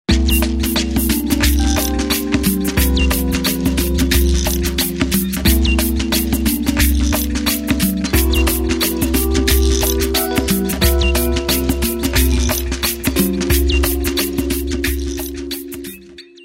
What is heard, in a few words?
OCEAN NATIVE PERCS